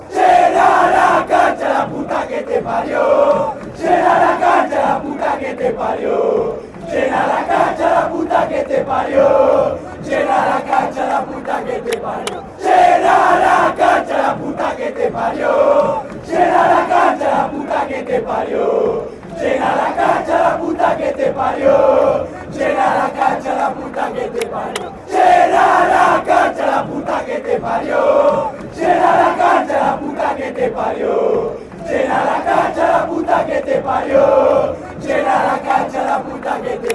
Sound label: almirante chant, argentina